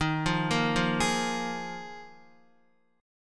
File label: Eb Suspended 2nd Suspended 4th, Guitar; arpeggiated; chord; x,6,3,3,5,3; JGuitar